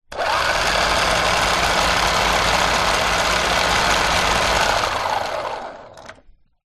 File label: leisure hotwheels car track booster motor on run off